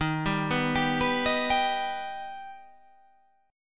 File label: D sharp Augmented D sharp, chord